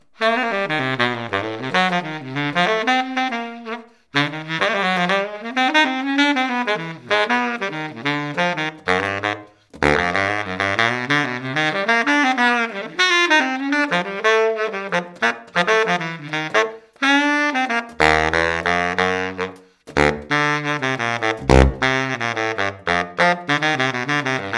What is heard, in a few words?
Baritone Sax Improv